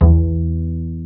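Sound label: acoustic bass E1; E-MU Formula 4000 Series Vol. 5; Protozoa; Proteus3 Presets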